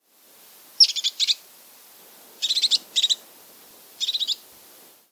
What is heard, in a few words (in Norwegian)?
toppmeis, Toppmeis (Lophophanes cristatus); falkenberg; Toppmeisa er lett kjennelig med svart-hvite og spraglete hod